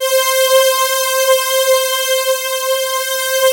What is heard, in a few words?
PAD C5, JD-800; Sound Gallery - Charged